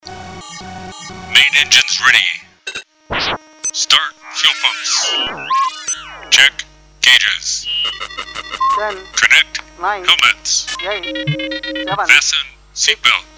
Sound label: Takeoff; VBS galaxy